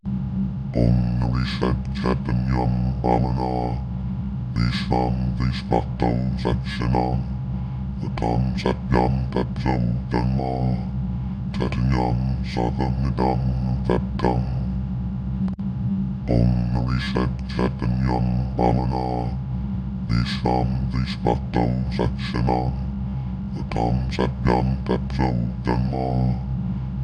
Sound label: voice vocals, mantra; ॐ नृशद् चैतन्यं ब्रह्मणः।